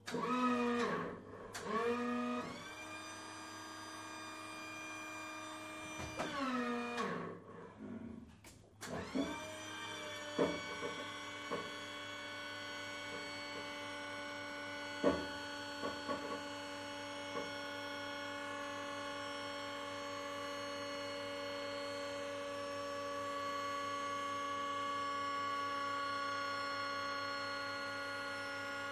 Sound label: Airplane, Internal Sounds W Pneumatic Whines.